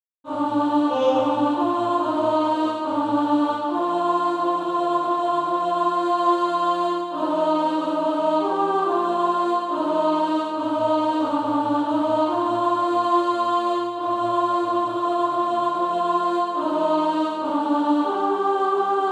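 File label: alt, Practise Music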